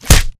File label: bullet light ballistic flesh; Titanfall 2